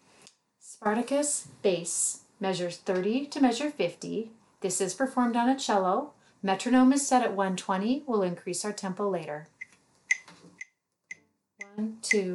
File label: bass; spartacus